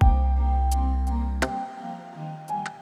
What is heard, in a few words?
Bending in the Air Bass and Arps and Thonk; BB 2HTC Samples Vol 4